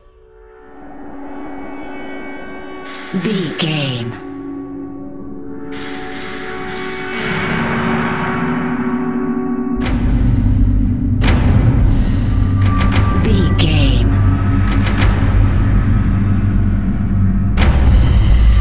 dark caves

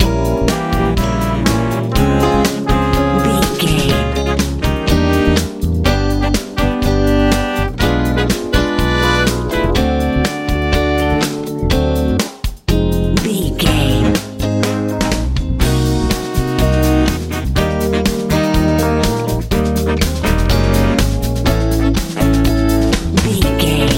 colorful nice wind sprint life funk jazz

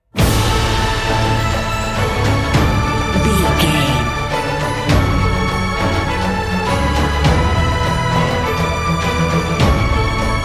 cinematic corporate

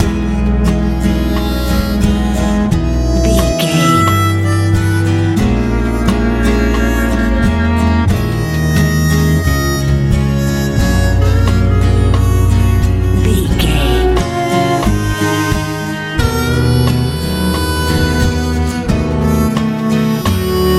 nice happy lite pop

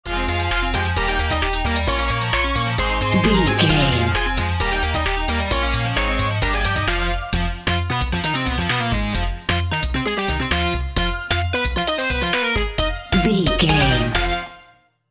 old school video game

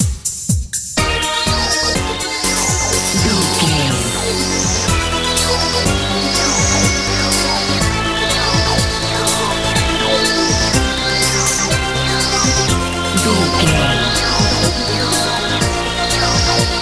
modern dance

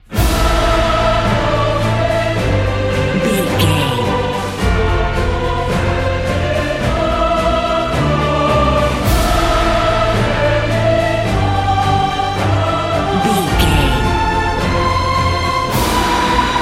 epic cinematic

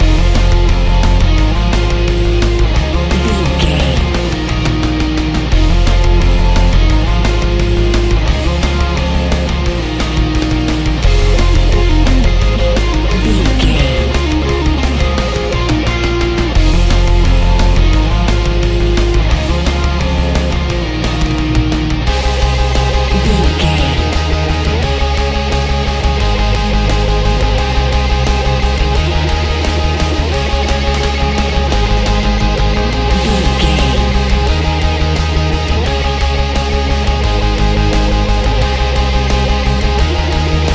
adrenaline overdrive loop

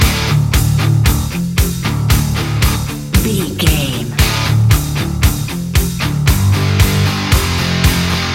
adrenaline loop

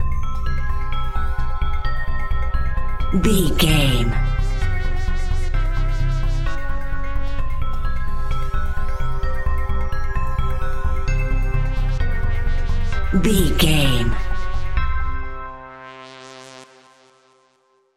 80s synth sci fi bumper

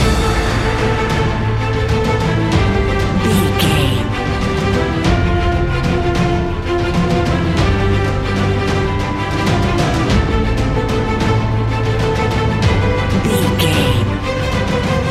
epic cinematic loop; battlefield

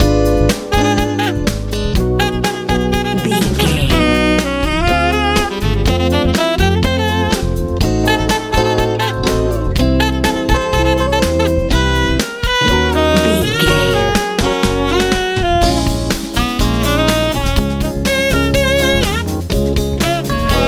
fun nice wind sprint dreams funk jazz

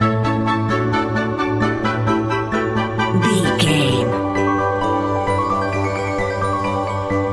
whimsical utopia loop

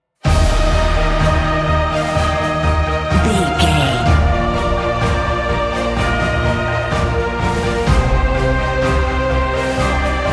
cinematic corporate

